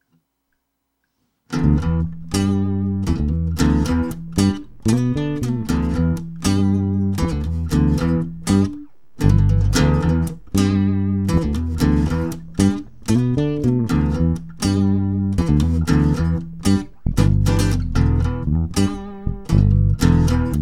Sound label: Acoustic Groove in E